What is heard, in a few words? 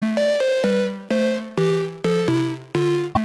Bounce tone